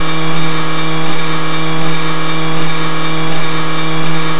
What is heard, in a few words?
Vehicle Loop; cstrike